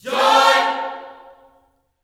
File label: JOY CHORD, Vocal Gospel; Spectrasonics; Vocal Planet Vol.1 Gospel; akai